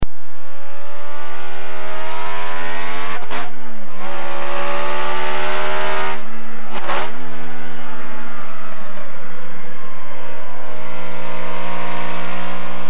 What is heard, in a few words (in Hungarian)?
Lotus 18, motorhangok; autosport